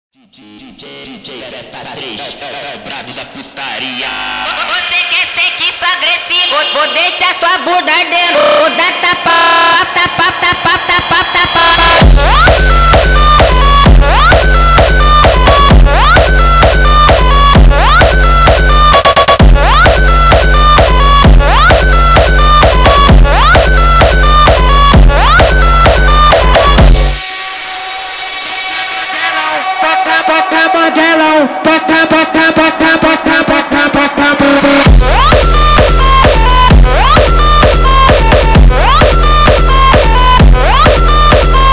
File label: Deixar hardstyle Remix